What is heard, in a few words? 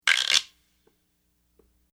Guirro Fish Latin Percussion Instrument Single